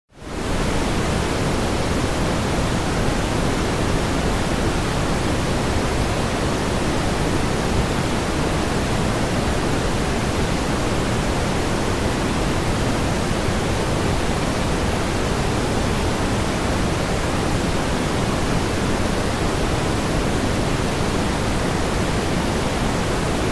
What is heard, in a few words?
Pink Noise